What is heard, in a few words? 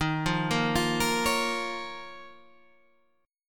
D sharp 7th Suspended 2nd, Guitar, Standard; D#7sus2 arpeggiated; JGuitar